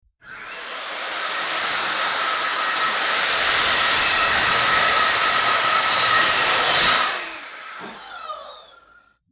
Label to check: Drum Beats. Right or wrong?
Wrong